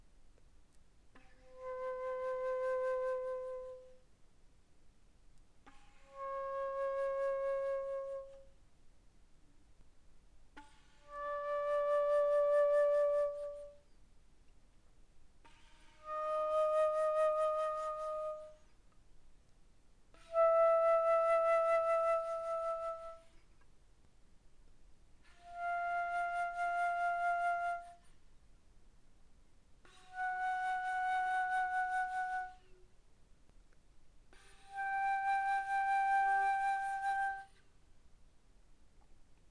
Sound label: Alto Flute pp C5 G5, woodwinds